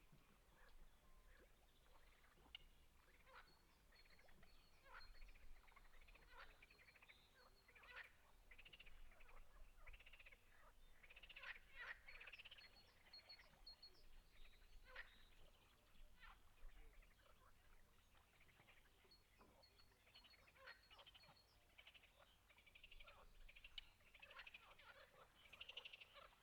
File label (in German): Froesche; Lexikon-Roman